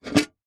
household teapot metal old pick up from hard surface